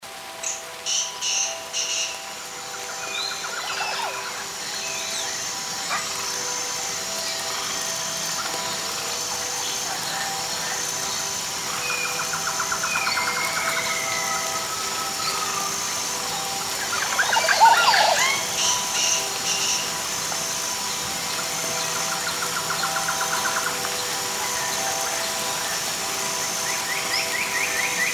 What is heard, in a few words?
Jungle Night in E-Minor, sleep calm chill noise meditation; Wholesome; sleep calm chill noise meditation podcast